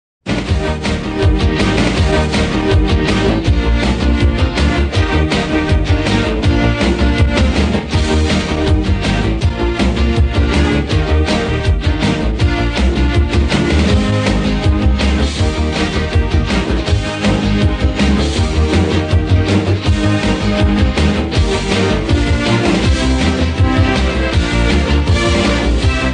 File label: Closing Instrumental; Sonic SatAM